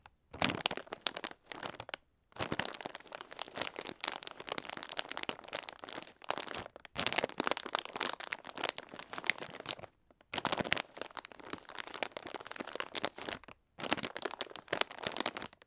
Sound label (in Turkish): cips poseti minciklama sesi; cips posetini minciklama sesi